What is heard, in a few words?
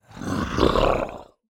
scary growl